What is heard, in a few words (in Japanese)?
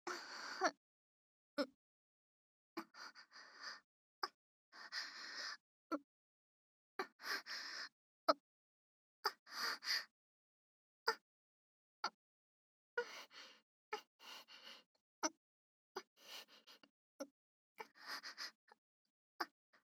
騎乗位喘ぎ